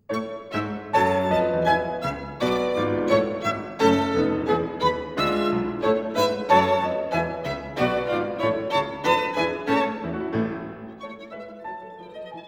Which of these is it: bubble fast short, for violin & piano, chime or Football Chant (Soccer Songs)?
for violin & piano